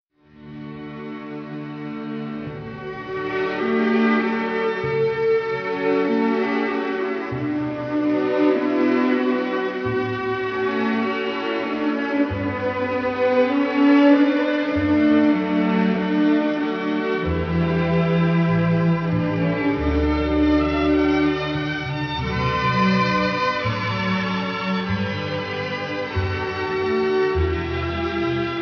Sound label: emotional ringtone; classical